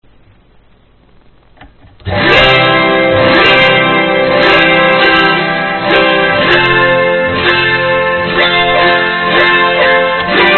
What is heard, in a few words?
72 strings, autoharp